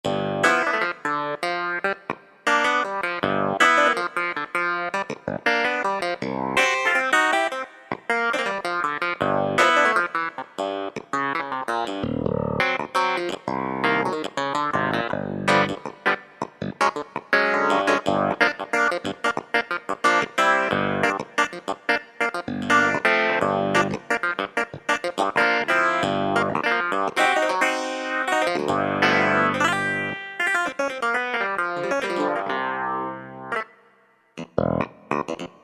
Touch Clav

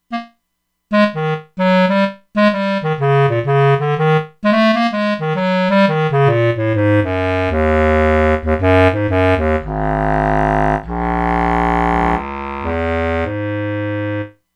Bass Clar Bb, E-mu Proteus-2; Factory Presets

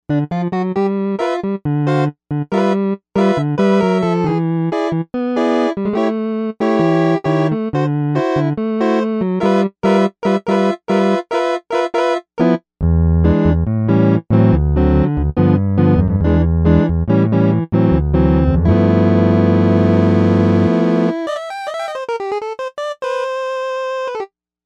Dusty Tape Organ; Novation UltraNova; Factory Patches

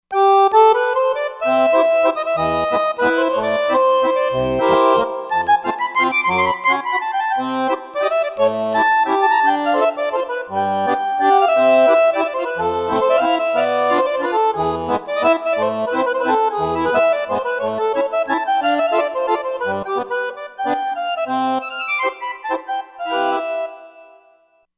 Roland Fantom XR; ParisRomance

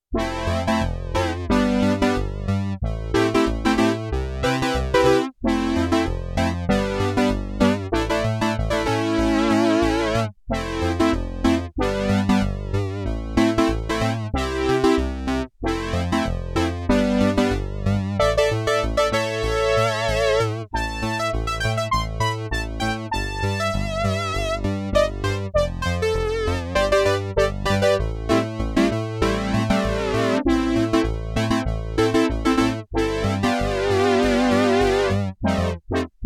FAT BRASS, SIEL DK 80; Factory

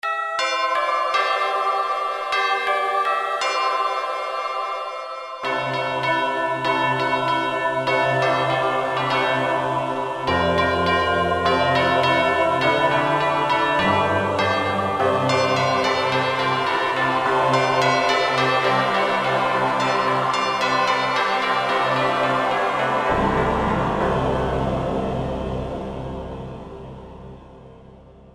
Christmas!!!, Roland SR-JV80-02 Orchestral

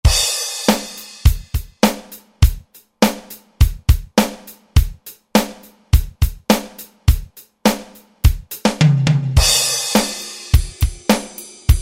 Pop Drum Set 1, Roland JV-2080; Rhythm

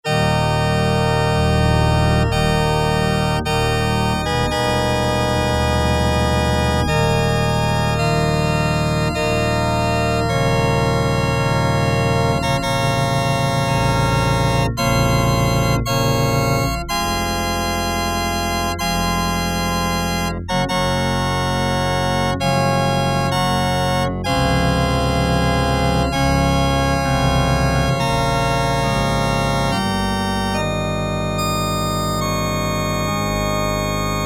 PIPES, Yamaha DX7

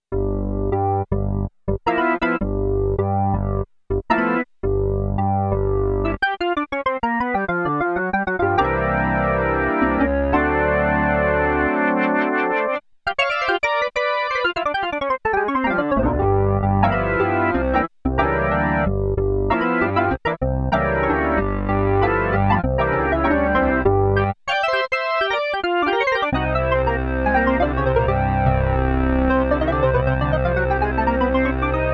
ORGAN, SIEL DK 80; Factory

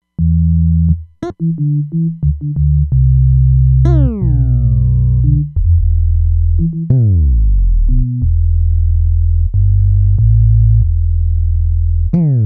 Bs Slide

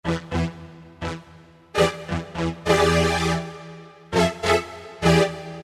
Tension Strings; Roland PN-D50-03

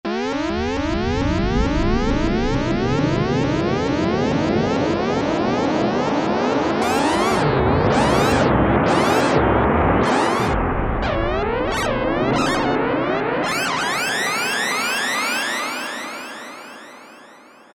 Emergency, Roland U-220; Factory Patches

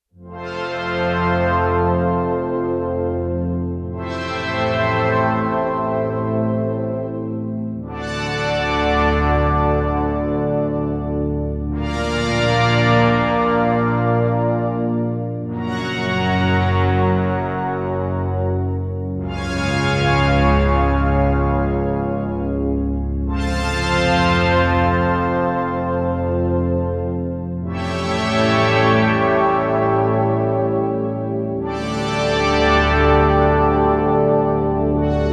Slw Brss Tutti; Digital Synthesizer

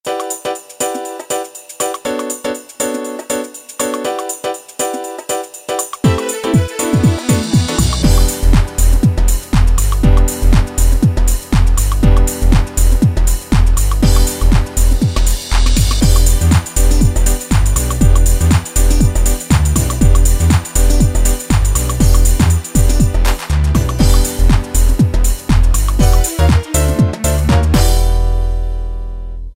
Hip House; Yamaha DJX; Styles

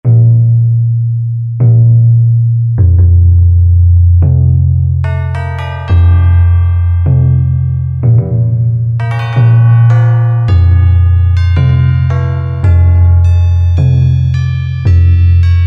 Timp & Bells, Korg M1; Factory preset examples

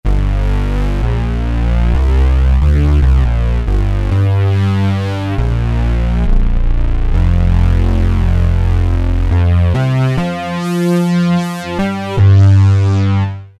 Prophetic Bass, Roland JD-990; Vintage Board

